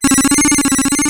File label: Robot, Retro Games Sound FX